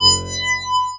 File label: Animation Electronic